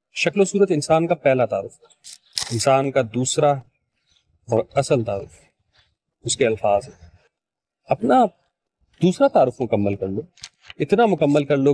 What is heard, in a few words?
vocals